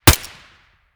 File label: fiveseven silencer mono distant, weapons